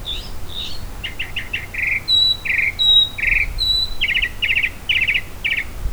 Phainopepla; Park; Andrea Cornelius

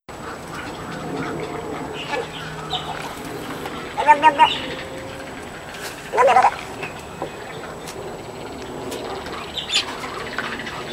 Snowy Egret in Mating Season

